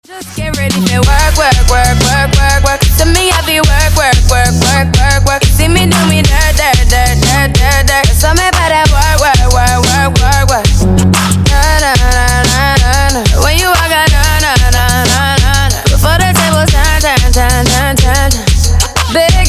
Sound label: drums